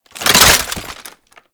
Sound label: Rocket Launcher Drop; Warframe; Weapons; Tenno; Lasrian